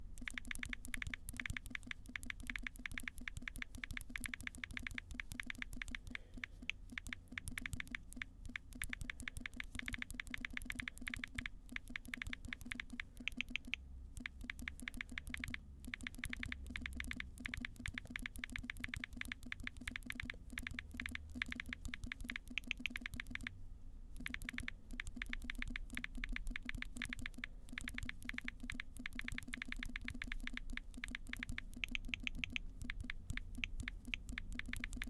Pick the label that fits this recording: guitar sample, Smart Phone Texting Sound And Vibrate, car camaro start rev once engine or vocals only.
Smart Phone Texting Sound And Vibrate